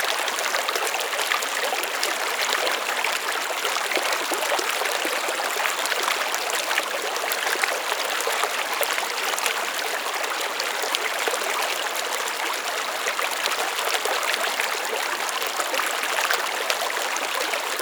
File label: ambience water